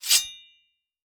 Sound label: item knife small pickup